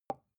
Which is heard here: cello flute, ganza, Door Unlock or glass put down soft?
glass put down soft